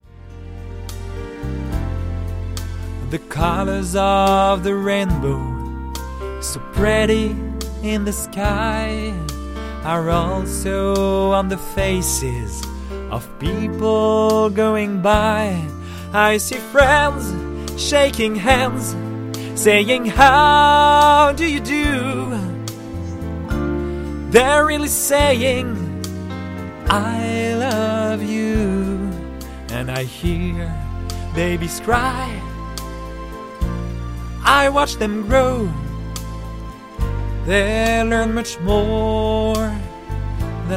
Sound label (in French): chant